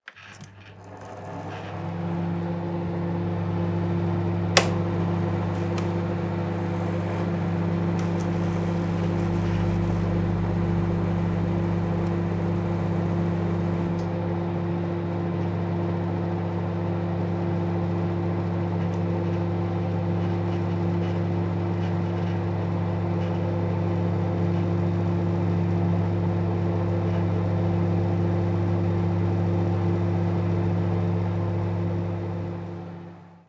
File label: Microwave running soft humming; appliances